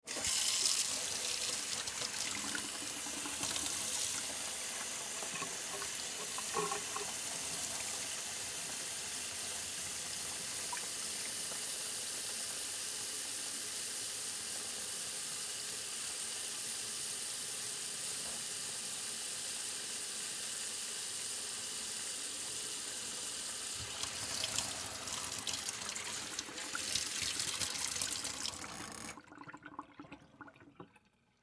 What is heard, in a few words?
Sink On Run Off, Sink Turn On Run Off Water; Household; Kitchen Water; Bathroom